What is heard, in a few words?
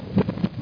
FLUTTER; lands